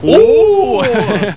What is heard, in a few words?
ooo